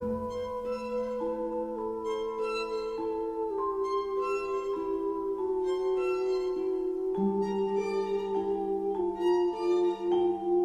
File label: strings heartbreaking, Sad; Loops